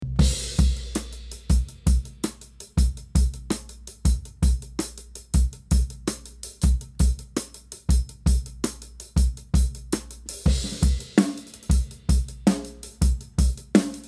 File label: Section A Snare Bottom and Toms